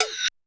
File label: Korg 01W MegaDrums; 300 Drum Machines